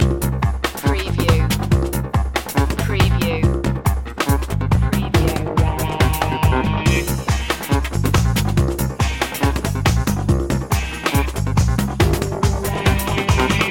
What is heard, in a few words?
leslie jumping loop